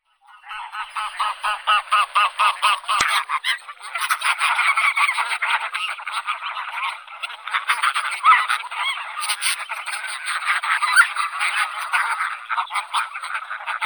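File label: greylag goose, Anser anser; gus serii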